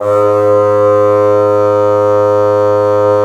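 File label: wind bassoon; LA Composer vol. 3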